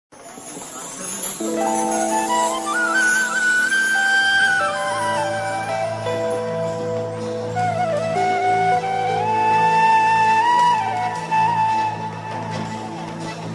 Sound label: BG Tune; Tv Serials; Zee TV